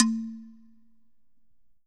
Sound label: A3, Slit Drum; Drums Percussion